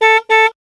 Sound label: beep; Samsung GT-i7680; cmcc